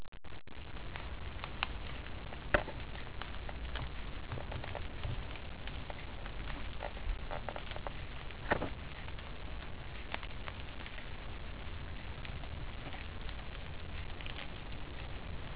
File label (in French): craquement